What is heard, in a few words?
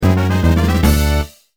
Fanfare Solve; Ranger